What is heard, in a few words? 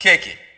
kick it